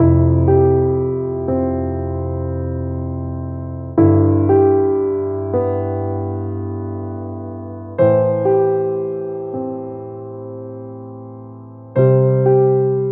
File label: EMOTION PIANO LOOPS, 120 BPM, C